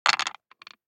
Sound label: DICE on Wooden Tray, Throw and Roll, Standard, 3 Three Dice; Samples; ArticulatedSounds; DICE Sfx Party (Extended)